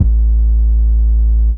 kick 808 Am saw distorted; Kicks; single hits

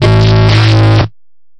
unplug me, Bass

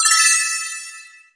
too cute bells

